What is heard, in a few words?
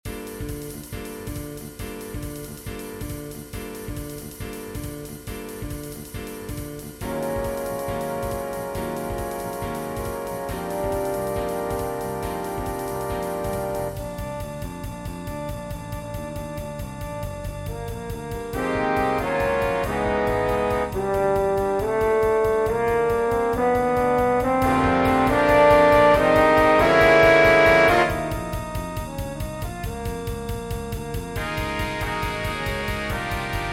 Big Band with female vocal Bb